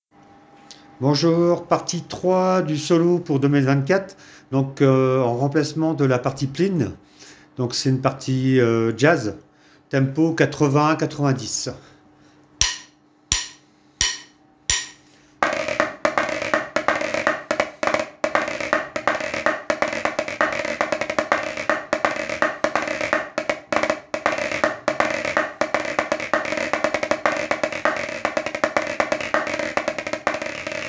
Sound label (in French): solo jaz